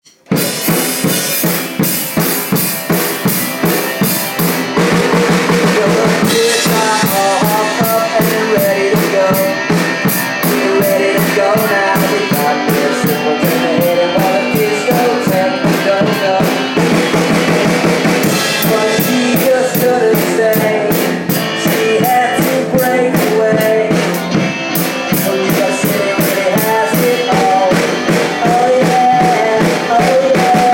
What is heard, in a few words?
drum solo